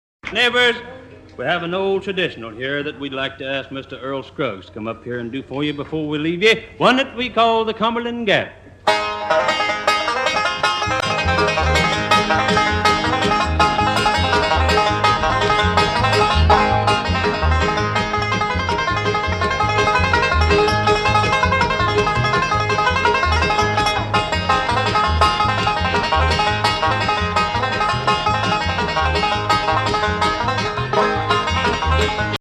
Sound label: Banjo